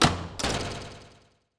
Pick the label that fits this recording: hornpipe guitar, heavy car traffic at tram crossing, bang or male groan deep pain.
bang